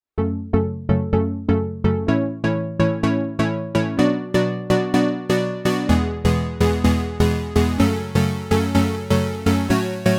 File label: Heavy Solid Bs